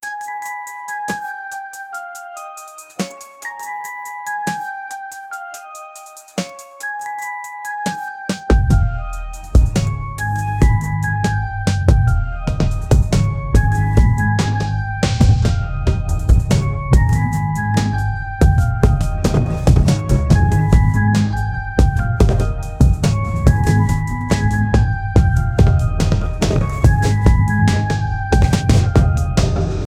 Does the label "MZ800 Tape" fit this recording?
No